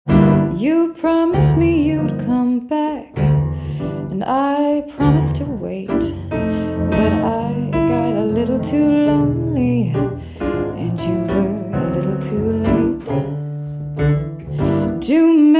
jazz and blue, acoustic duo, Piano & Female Vocals